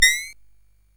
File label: Dark Castle, CSG8580R5